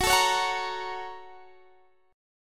Bb Minor Major 7th Sharp 5th, Gb

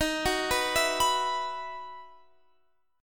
E Major 7th Suspended 2nd

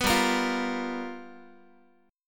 G 7th Sharp 9th G chord, Guitar; G7#9 strummed; 14,15,13,15,x,x

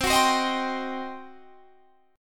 G Major 7th Suspended 4th Sharp 5th C

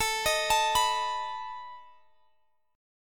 A Suspended 2nd Flat 5th A down Guitar Open G

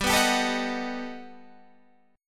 G Augmented Major 9th

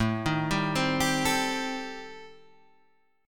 A Minor 7th Flat 5th A, Guitar, Standard; arpeggiated; JGuitar

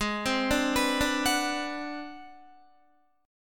G sharp 7th Sharp 9th Flat 5th, Guitar; G#7#9b5; arpeggiated; 15,15,14,16,14,14; 4F,4A,5C,5G,5C,6E

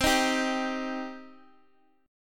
C Minor C down

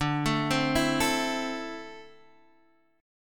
D 7th Suspended 2nd down Guitar Standard; D7sus2 arpeggiated

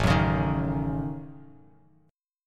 A 6th Flat 5th, Bass Standard; A6b5; strummed; JGuitar

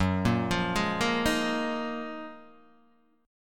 Gb Major 13th, Guitar, Open D; chordshape; down

